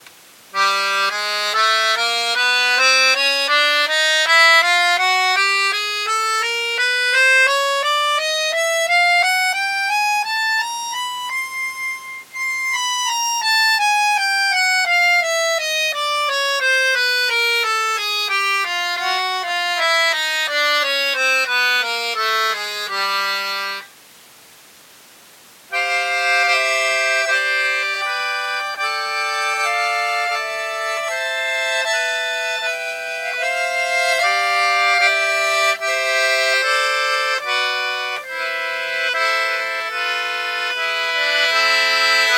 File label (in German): VICTORIA freebass diskant, akkordeon